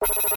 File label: multimedia Click Electronic; little robot sound factory